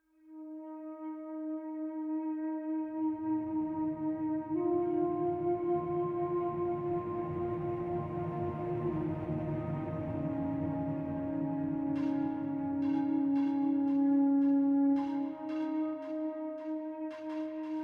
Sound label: jungle beach atmosphere; Poptropica